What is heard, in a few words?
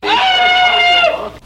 scream non gender; ooh ahh